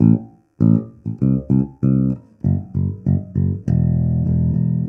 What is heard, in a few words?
Statement, Rock Bass Loops; ACID MUSIC